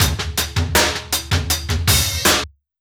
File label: 080 Chill Pop Drums; Pro Tools Essential Loops; Pop Rock; Moderate Tempo